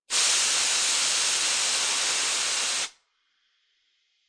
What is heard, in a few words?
Air Can Spray Long Spray